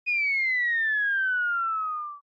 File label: Motion Falling Whistle Long